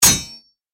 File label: Sword clash impact metal clang